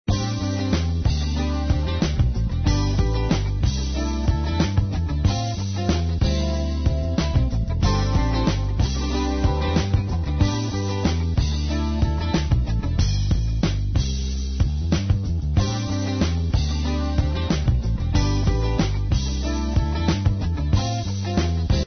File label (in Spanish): guitarras lenta